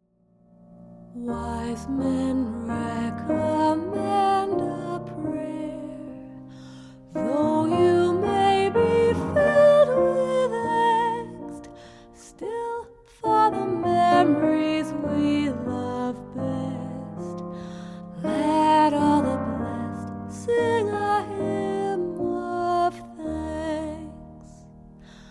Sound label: Hymn of Thanks